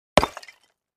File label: Clay Chimney Vent Hit, concrete & brick